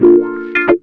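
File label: guitar chord wah funk; Univers Sons; Jazzistic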